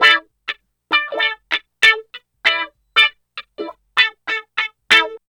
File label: GUITAR; East West - Phat & Phunky CD2; 90's Sample CDs